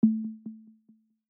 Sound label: dice loss